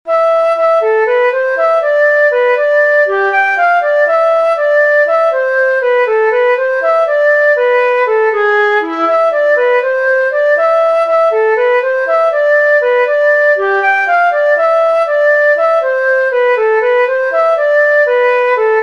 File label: Nettle Cake melody